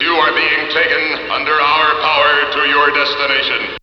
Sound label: VOX; Zero G; Man Machine